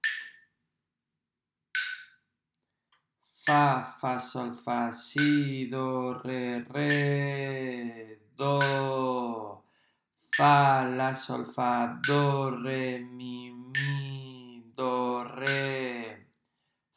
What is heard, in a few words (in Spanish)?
RITMO